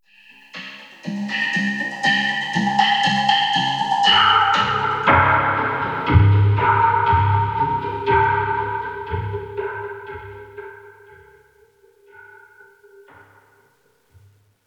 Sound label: Rand Music